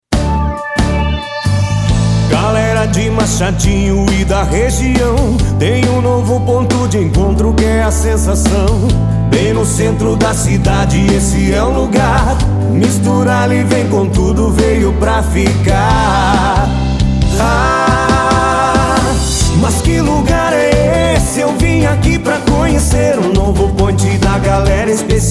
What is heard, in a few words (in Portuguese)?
Jingle; Misturale